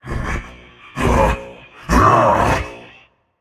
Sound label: fear, voicepack; smite; jorm